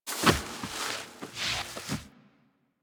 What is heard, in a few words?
Kubrow Foley Interact Sleep Roll Over; Enemies; Warframe